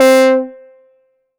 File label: lead C4, EMS VCS3; Polyend; Simulacrum Sounds; 1 Shots; Synths; Leads and Keys